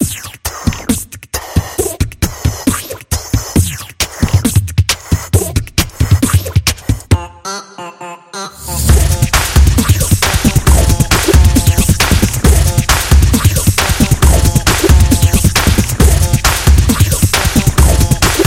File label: Energetic Beatbox